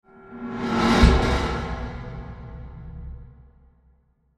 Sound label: Fast Metallic Transition Ends With A Hit; Scary Soundscape; CraftedSignals